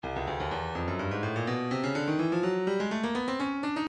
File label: breakbeat 125 bpm; Cooked D; Stylus Breakbeat Midi Files